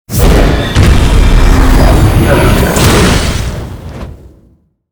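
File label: Final boss spawn, monster; Balierd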